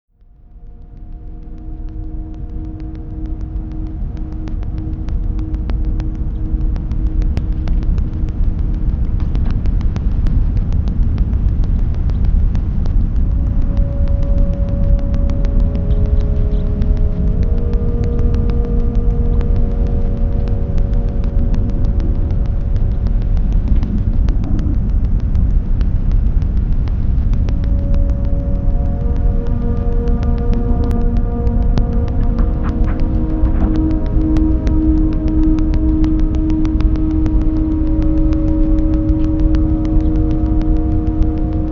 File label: slow motion static